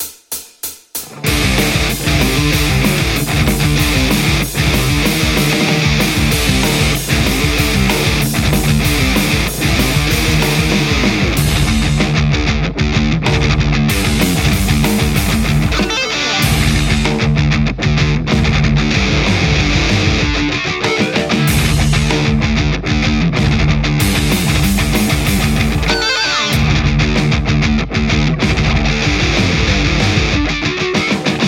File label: metal lespaul x50 b3; tse